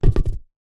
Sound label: sport beach soccer ball down on ground